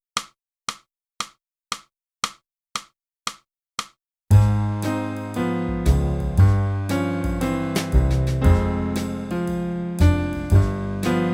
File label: clarinet; Boosey Woodwind Method: Clarinet (Book 2)